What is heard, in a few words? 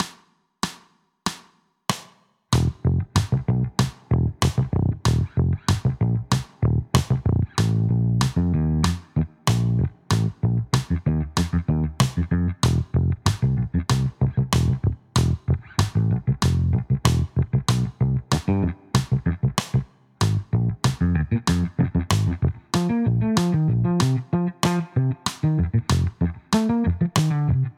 bass solo; funkastic; sampleson